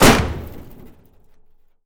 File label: P-51D Gear Door Broken; DCS